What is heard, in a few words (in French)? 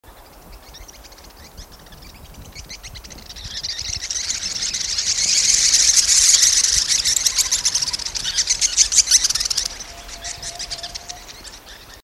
martinet a menton blanc, chant